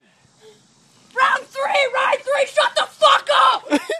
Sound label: little kid flips out